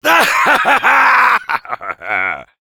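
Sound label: laugh happy, French; Heavy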